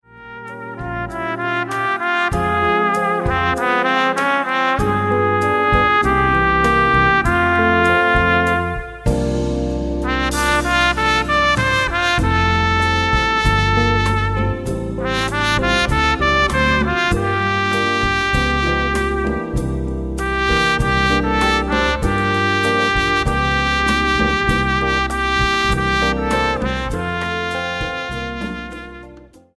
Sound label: trompet; Man binder os paa mund og haand